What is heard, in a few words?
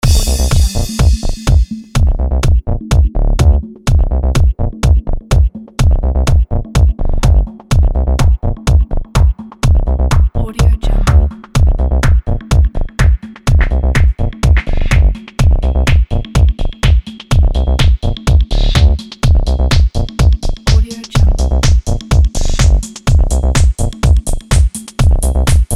electro games